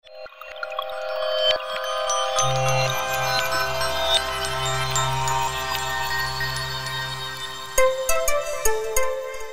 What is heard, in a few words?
boot audio; Okapia; Signature L